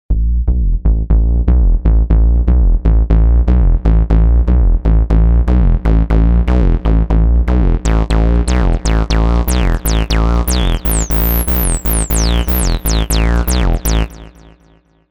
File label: Rubber Bass